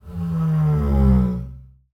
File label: INSTRU STRING Double Bass, Bowed, Harmonic, Low, Slide, Whale Call; Articulated Sounds; Special Double Bass Fx